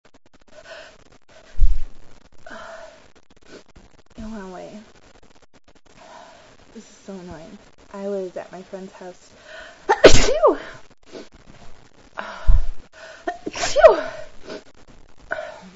cat, female

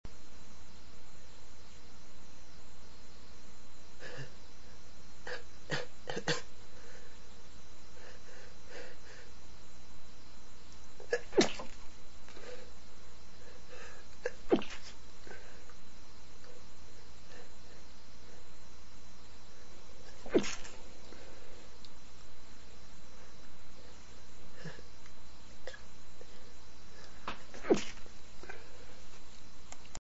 stifles with buildups, male, sneezes

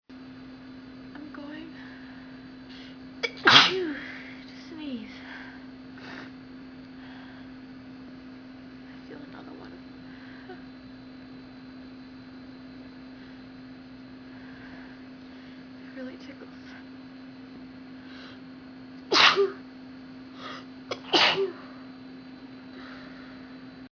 gonna sneeze, female

